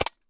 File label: famas clip hit, weapons; cstrike; csgo